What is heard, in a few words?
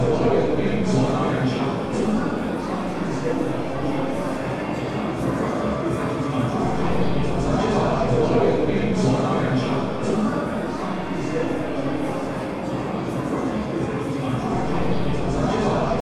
Crowd; brainpipe